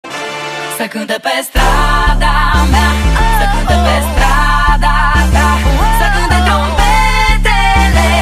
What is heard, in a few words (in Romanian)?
trompetele